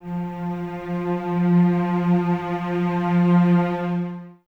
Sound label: Cellos F 3 Expressivo; Miroslav Vitous; String Ensembles